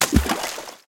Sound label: splash